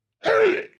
death grunt; SoundsCrate

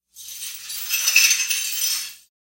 Glass Debris; SoundsCrate

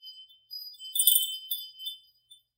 Wind Chimes C

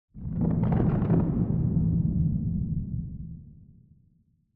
Thunder Crack; soundscrate

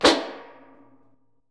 metal trashcan lid pop; soundscrate

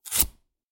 Cutting Board Vegetable Chop; soundscrate

